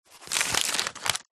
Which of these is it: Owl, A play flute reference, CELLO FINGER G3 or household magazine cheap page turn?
household magazine cheap page turn